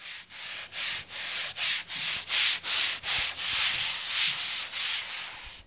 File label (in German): Putzen